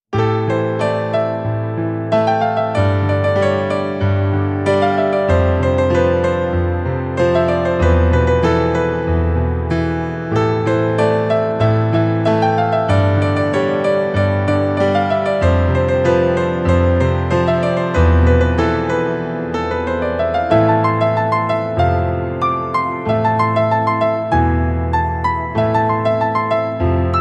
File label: bgm piano keyboard notes; kadhalar dhinam